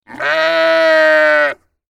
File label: bahh